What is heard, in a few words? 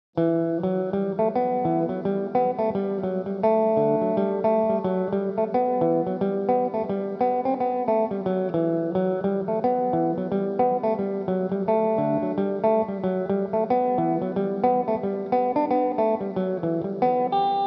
fig for a kiss emin, mandolin